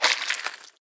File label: limb slash; Damage; Barotrauma; Subsurface